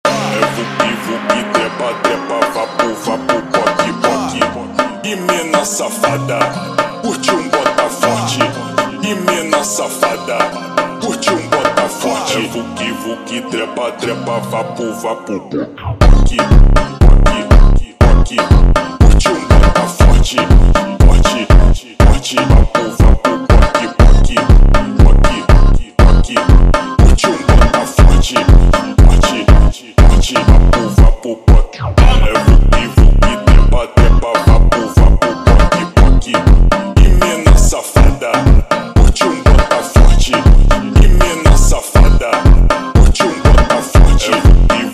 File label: Extremely Sad Funk slowed